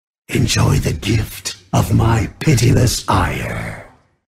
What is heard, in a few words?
dead, grunt sounds